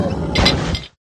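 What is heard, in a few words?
death, pet manticore; pets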